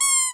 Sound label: Squeak